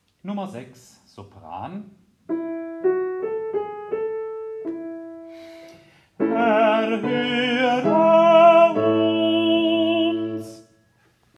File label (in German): Sopran